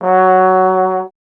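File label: Brass Trombone F#0; Miroslav Vitous - Solo Instruments VOL-1; 90 s Sample CDs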